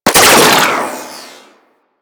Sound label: Freeze Ray Shot 3D; Warframe; Weapons; Corpus